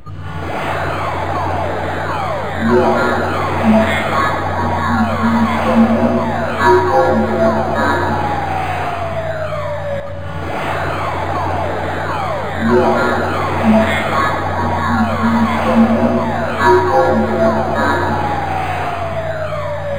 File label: jungle; Rumbles